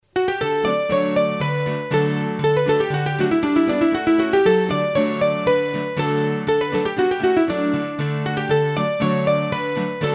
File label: Rigadon, hurdy gurdy; Rigadon Bressan